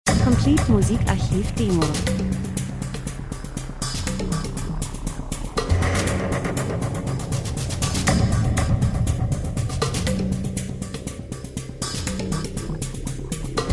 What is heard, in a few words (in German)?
rhythmus; Spannung Krimi gleichförmig, fremdartig Natur Reisefilm; Stimmung; drums